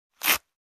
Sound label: Ripping of sticker